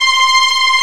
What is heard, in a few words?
TREMO VIOL; E-MU Formula 4000 Series Vol. 5; Protozoa; Proteus2 Instrmt